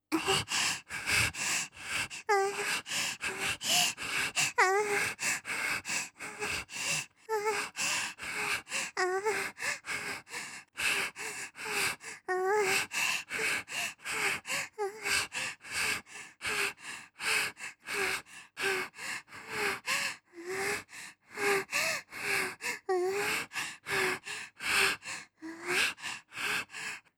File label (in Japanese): 吐息